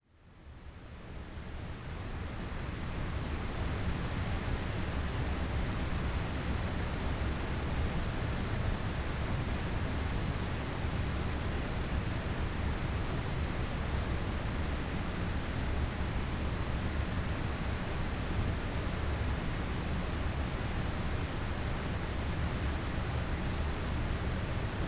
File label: Winter Waterfall Atmospheric Surround